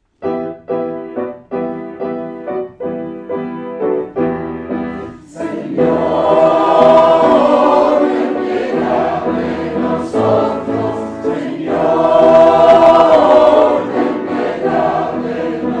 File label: SATB